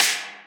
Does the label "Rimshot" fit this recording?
Yes